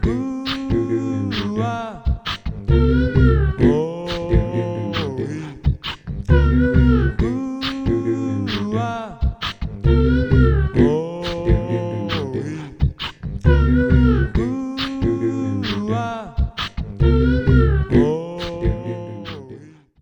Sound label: Bully Time Laugh, music loops